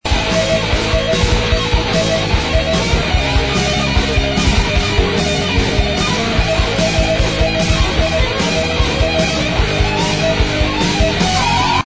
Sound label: Victory solo